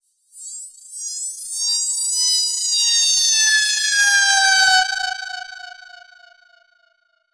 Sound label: Filter Proc, alarm siren, 100bpm; musicradar